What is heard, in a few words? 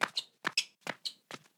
Walking - Shoe Squeaking; Ed Edd n Eddy; Adromulus Pack; EEnE